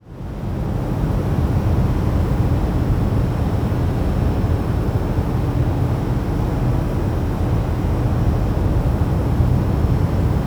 background machine room ambience; Bluezone Corporation

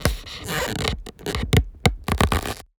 forest tree wood texture creaking mangle; Bluezone Corporation; Nature

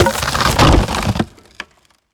texture wood; mountain dweller; Bluezone Corporation

